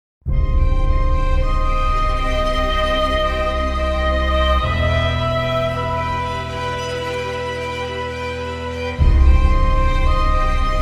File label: Creepy; Scriptnotes